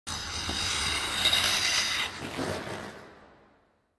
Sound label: Ice Skating Figure S